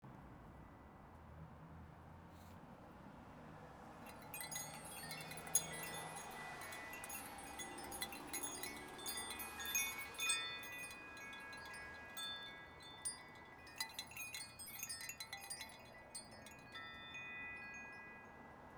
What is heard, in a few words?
windchimes